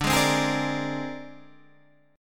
C 7th Suspended 2nd Sharp 5th D

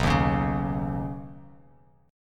B Major Add 9th, Badd9 chord, Bass Standard; strummed; 7,6,11,6

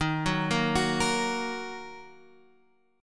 D sharp Minor, Guitar, Standard; arpeggiated; JGuitar

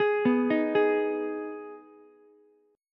D sharp Suspended 4th Sharp 5th, Ukulele; arpeggiated; JGuitar

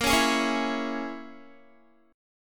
Bb Minor 7th Flat 5th, Guitar; strummed; 18,16,18,18,16,x; 4E,4A,5D,5G,5C,6F

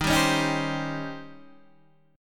D sharp 13th, Guitar, Half Step Down

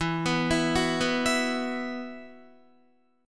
E Suspended 2nd, Guitar; Esus2; arpeggiated; 12,14,14,11,11,11

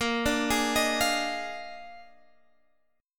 A sharp 11th, Guitar Open F; arpeggiated; JGuitar

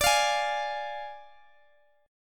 D Diminished, Guitar, Standard; Ddim strummed

